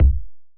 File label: trap kick